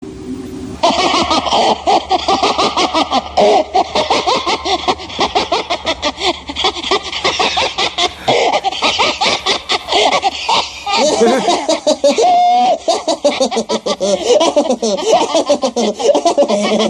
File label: muhahahahah